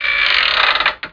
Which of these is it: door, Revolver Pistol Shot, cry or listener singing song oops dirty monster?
door